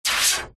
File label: magic wand zap spell; zapsplat